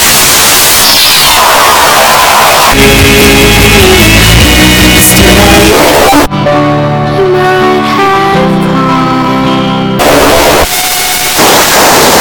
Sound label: Twee Pop